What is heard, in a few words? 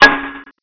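crowbar hit, weapons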